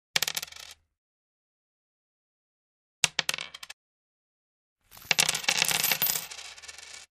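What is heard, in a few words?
Coins, Small, Drops x3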